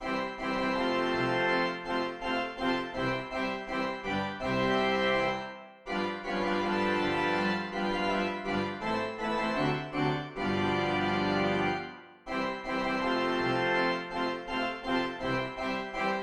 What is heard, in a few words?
March; Lefebure Wely